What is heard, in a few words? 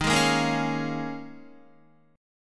D sharp 7th Suspended 2nd Suspended 4th D sharp, Guitar; strummed; chordshape; JGuitar